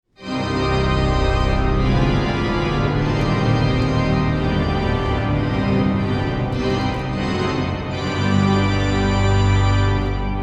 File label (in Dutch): Orgelsolo